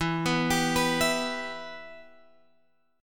E-Minor, Guitar, Open G; 14,16,17,16,17,x

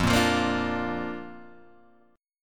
F 6th Suspended 4th F, chord; F64 strummed

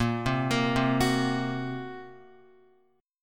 Bb Suspended 2nd Sharp 5th, Guitar; Bbsus2#5; arpeggiated; x,1,0,3,0,2; JGuitar

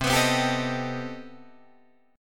C Minor Major 13th C down Guitar DADGAD

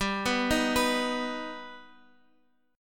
G Augmented, Guitar; arpeggiated

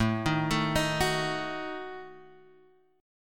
Eb Diminished A

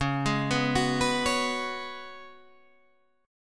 F sharp Suspended 2nd Suspended 4th

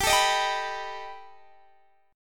B Augmented 7th G, chord

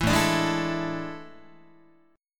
A Major 7th Suspended 4th, Guitar; AM7sus4; strummed; 7,5,5,6,8,8

